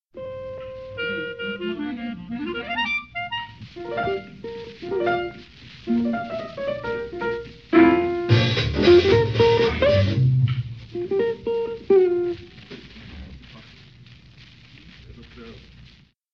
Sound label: Tune Up Blues